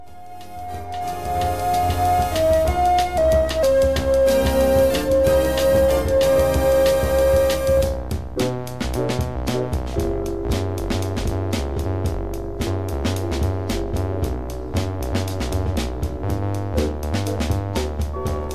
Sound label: moog; Columbia; コロムビア企画の60sムーグ〜ロック・アルバム